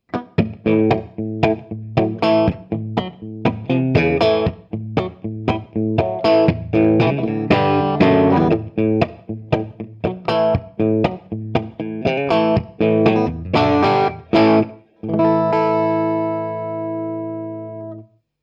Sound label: Electro Harmonix 5MM Clean